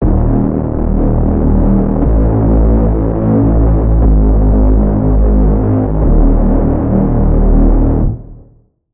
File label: Reese - Total Dominant; Routed Sound; Vital Expansion - Street Vanquisher Vol.1